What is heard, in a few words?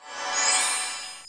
HP item use